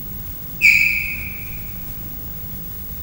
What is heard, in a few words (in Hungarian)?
három hónapos kölyök egyet csipog, leopárd; miskolci zoo 2009